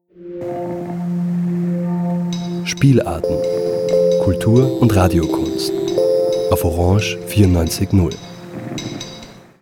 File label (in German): spartenjingle; spielarten; orange